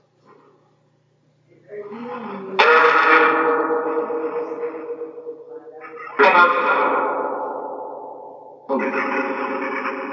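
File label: Train Station Phasma Box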